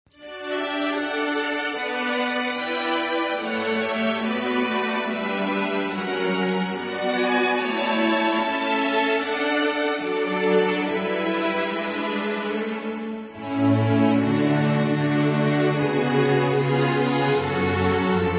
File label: Orchestra